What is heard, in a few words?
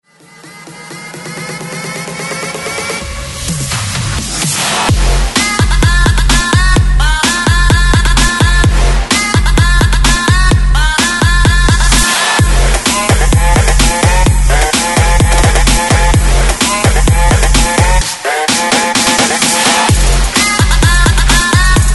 128 break mix; DJDOLLS